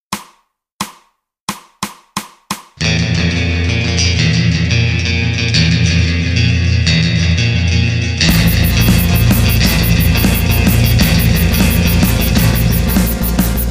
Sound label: backing